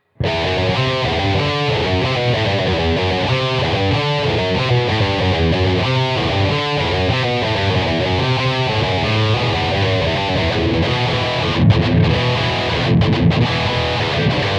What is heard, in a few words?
heavy